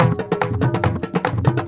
India, Heart of Asia

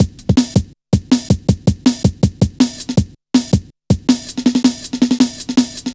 drum loop, 161.5; Zero-G Jungle Warfare 1, 2 & 3

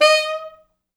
D#4, Pop Brass; Best Service ProSamples vol.19; AKAI

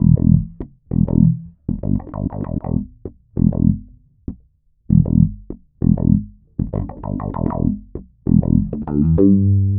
Filtered Funky Bass, Electric Bass

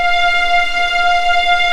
F5A LEG, Violins; East West; The Ultimate String Collection

